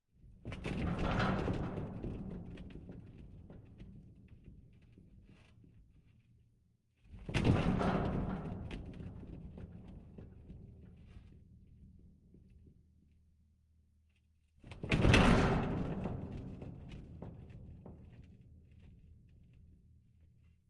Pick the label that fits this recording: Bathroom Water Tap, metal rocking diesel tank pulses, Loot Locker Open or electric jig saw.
metal rocking diesel tank pulses